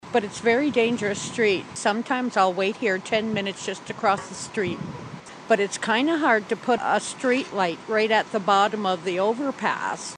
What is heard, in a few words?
crash; prescott